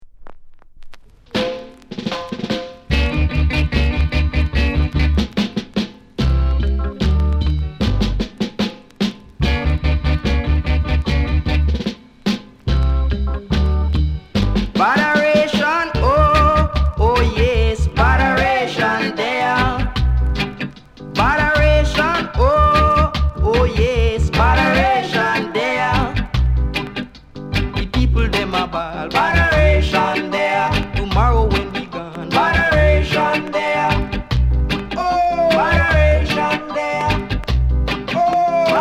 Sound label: INSTRUMENTAL TROMBONE